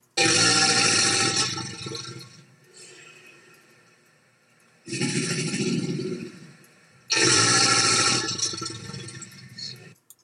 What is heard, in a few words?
Gator